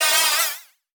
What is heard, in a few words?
Transition Gurgle Warble; Production Element